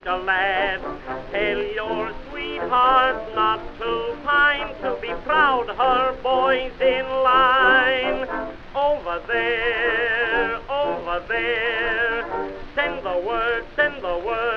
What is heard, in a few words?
baritone and chorus with orchestra